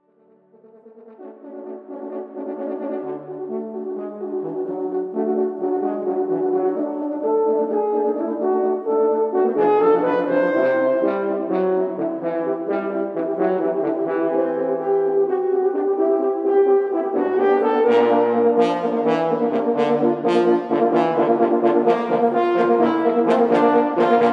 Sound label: Hornet Quartet; Fanfare for Barcs; Kerry Turner